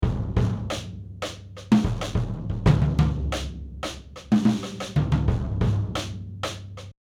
drum mix; grizzly

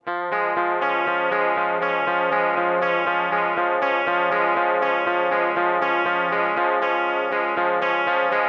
Clean Guitar With Chorus Subtle

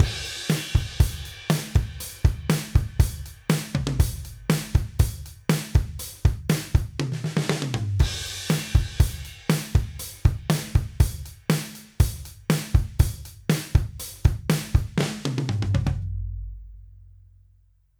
Drums

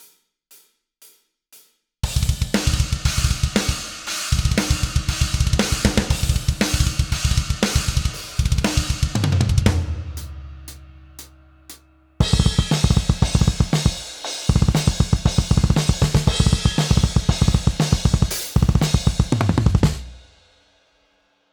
DRUMS WRONG SOUNDS

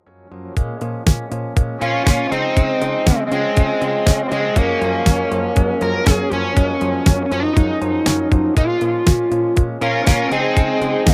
lead guitar with full mix

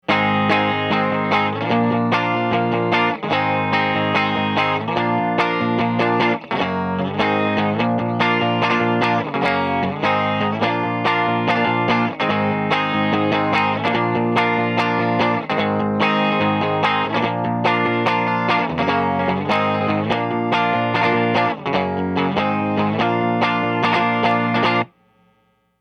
strat stock

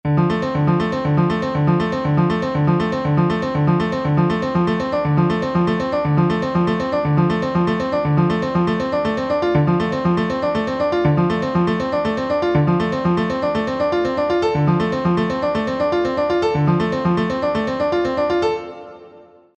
Inversions

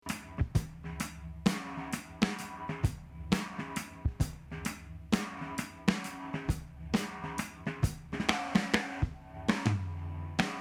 lofi loop drum mix

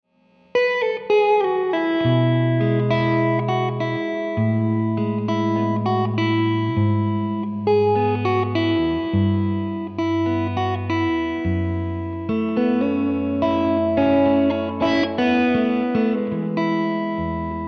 OCD Fingers

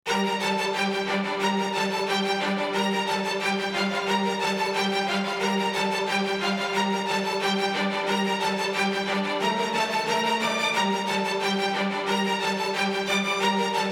string buss stacc solo

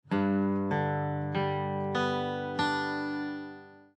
arp brass

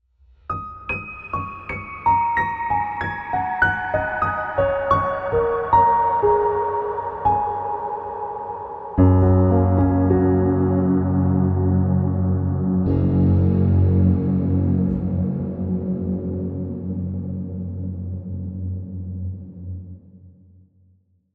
piano and guitar huge synth space; Valhalla

